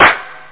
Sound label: claps